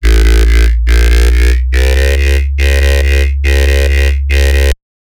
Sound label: Bassline, Dubstep and Trap